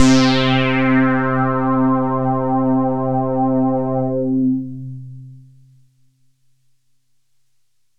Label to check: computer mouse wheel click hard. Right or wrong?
Wrong